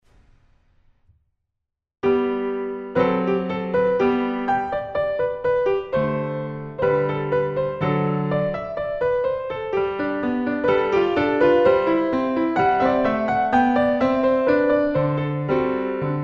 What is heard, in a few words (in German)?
Beethoven Sonatine G-Dur